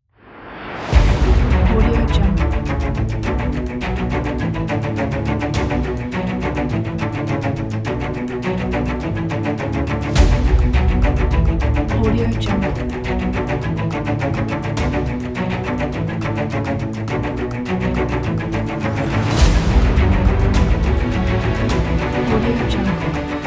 epic cinematic dramatic adventure hollywood trailer